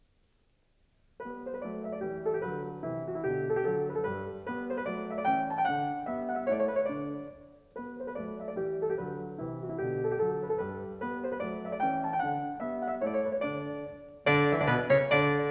Piano Sonata; Beethoven; Piano Sonata No25 Op79; Gulda

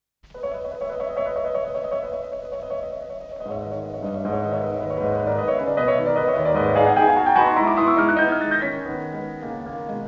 Etude Op25; Chopin

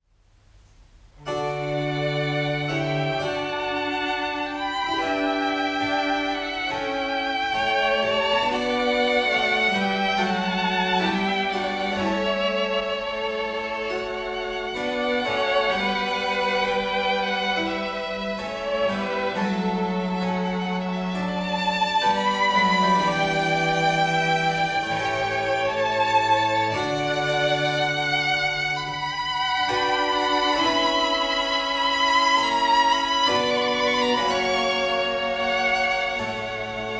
Sonata For Two Violins and Continuo Op5-2; Handel

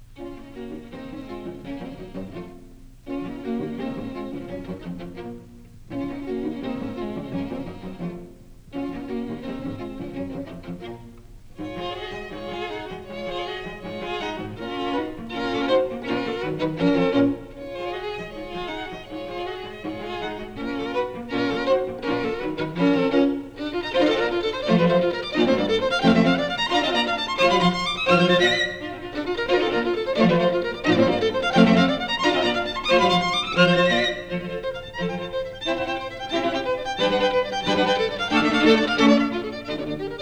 String Quartet; Beethoven; String Quartet No13 Op130; Pascal String Quartet

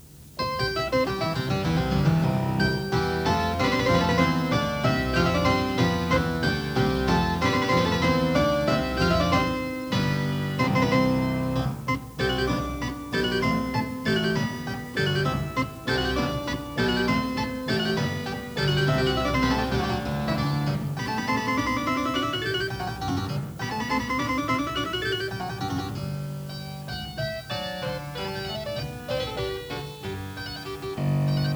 Scarlatti Keyboard Sonata K515; Landowska; Scarlatti 20 Sonates

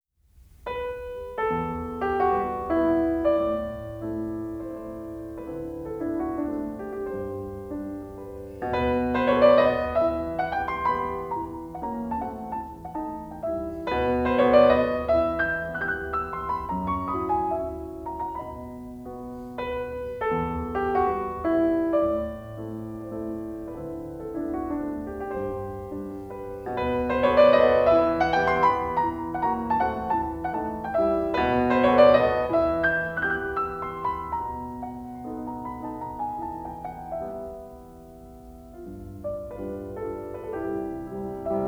Mazurka; Chopin; Op17-2; Kapell; The collection of mazurkas

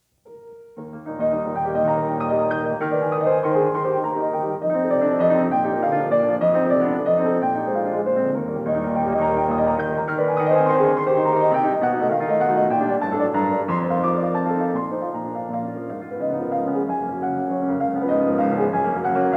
Preludes; Chopin; Preludes 19

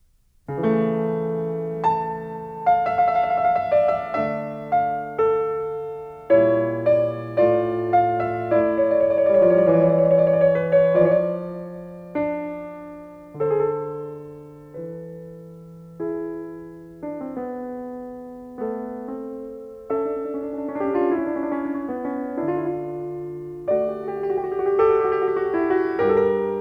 Keyboard Suite; Handel; Keyboard Suite No2 HWV427; Heidsieck

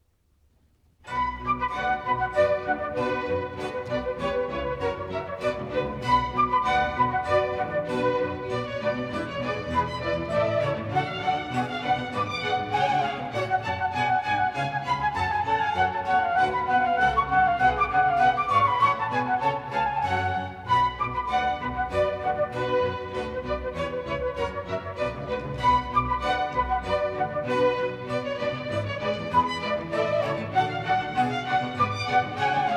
Orchestra Suite No2 BWV1067; Bach

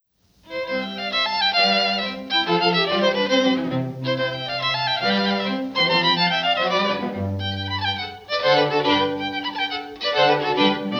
String Quartet; Haydn; String Quartet No6 Hob3-6; Pro Arte String Quartet

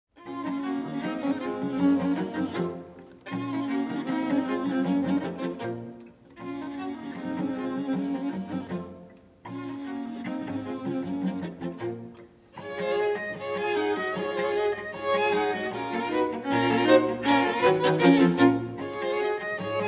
String Quartet; Beethoven; String Quartet No13; Vegh Quartet

